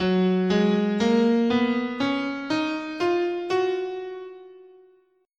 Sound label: F sharp Ionian, scale